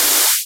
Open Hihat; Vengeance Samples; VEE Cymbals

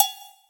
Agogo Bell Lo; Percs

